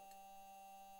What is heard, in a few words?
mic, mars2020 edlcam